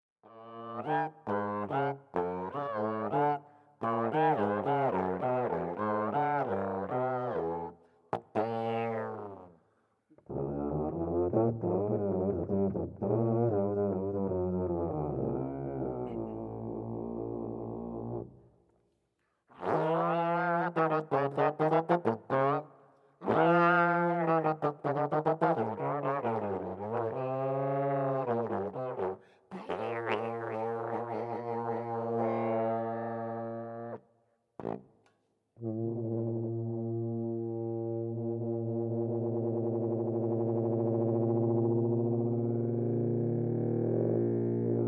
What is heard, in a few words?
Multiphonic Intro